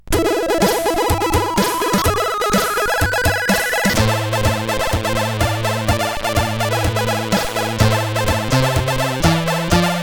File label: Intro Jam, CSG8580R5